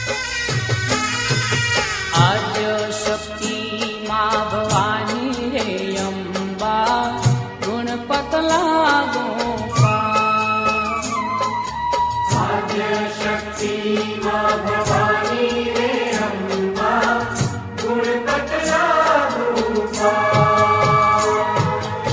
gujarati garba

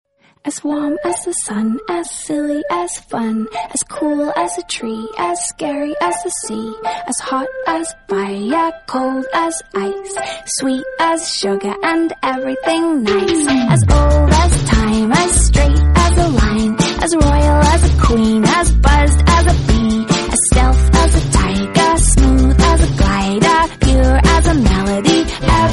Ad Ringtone English Rap; Amazon Prime

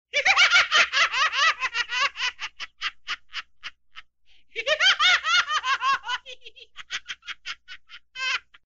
mad laugh

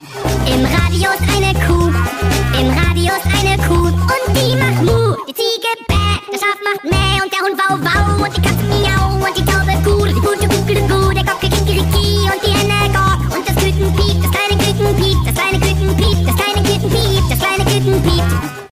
ringtone

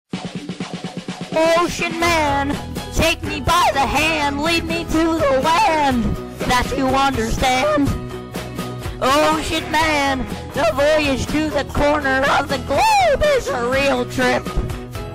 Voice Crack

